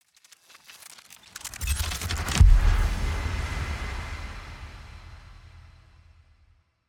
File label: cyberpunk stutter riser